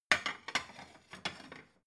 plate shelve slide soft

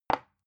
glass put down tipping fast

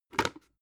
plastic bowl grab

